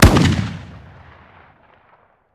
shotgun fire atmo ext; gmod; viper

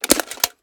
reload fast drum drumout; gmod; pi mike

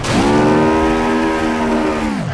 turbo, vehicles; blista

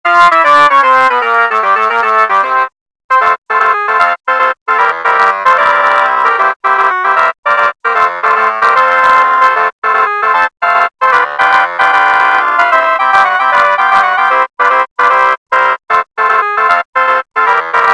sirens; gmod; tdmsirens; rozzers

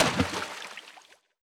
frag physics bounce water; gmod; viper

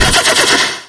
turbo blowoff; gmod; tdmcars

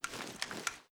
ar xmag reload rotate, weapons; gmod; viper

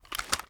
ar reload empty start, weapons; viper

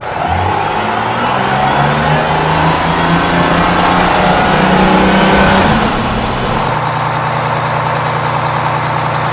apc throttle 1st; vehicles

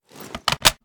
reload empty mag mag in; gmod

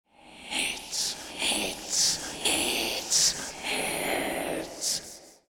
eyelander idle; gmod; durgz; meth

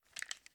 inspect, reloads; gmod

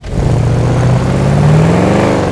first, vehicles; gmod; tdmcars; gtav; gauntlet

